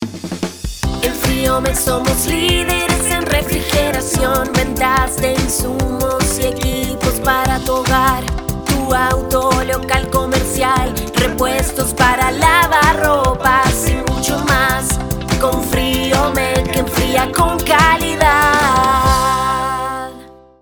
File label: jingle